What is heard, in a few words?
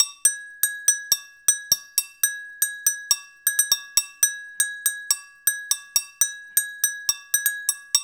120 Berim. Samba; Spectrasonics - Supreme Beats - World Dance